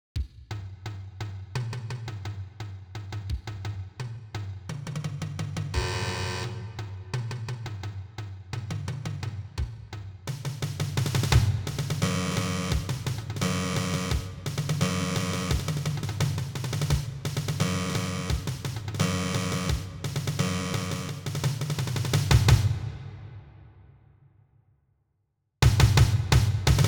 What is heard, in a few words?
Battery